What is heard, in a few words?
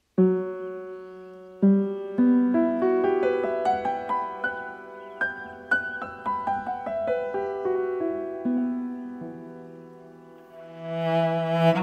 with Breeze (violin,cello ,piano&jazz drum)